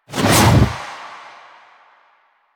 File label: Scythe Whoosh; Stalker Scythe